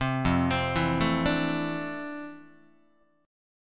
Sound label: F Major 13th Guitar; arpeggiated